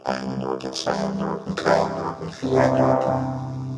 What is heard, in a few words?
vocoder